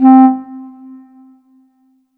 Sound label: Korg M3R Clarinet C4